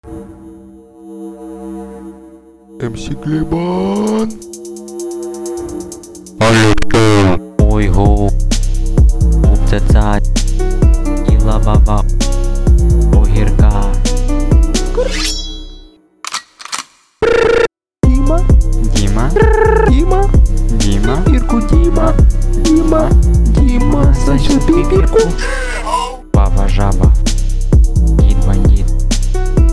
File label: hardbass hiphop